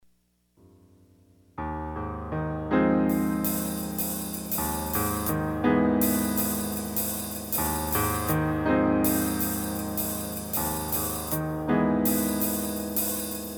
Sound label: Piano Fireplace